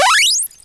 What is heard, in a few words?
open